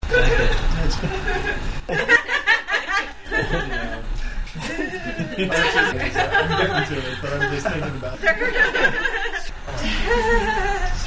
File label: laughs